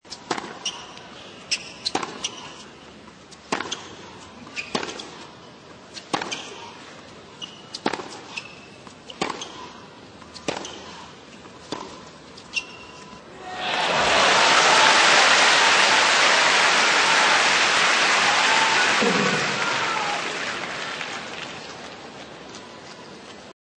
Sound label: Tennis Point Cheer